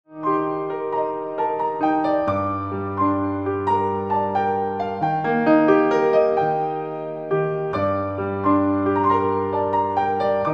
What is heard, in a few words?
warm up; modern class